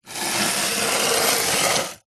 household hairbrush bristles plastic scrape wood wall